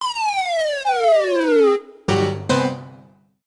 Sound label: Puzzle Fail; Pac Attack